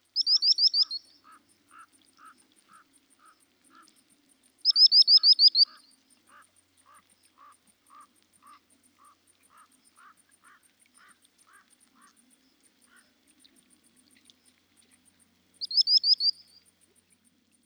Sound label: Common Sandpiper - Actitis hypoleucos; Krantinis Tilvikas